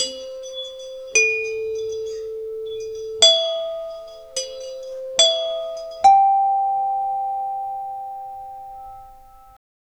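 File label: a tropical steelpan melody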